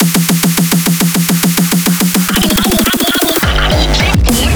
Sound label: Kinda nice, fills